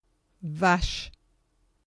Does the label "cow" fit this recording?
Yes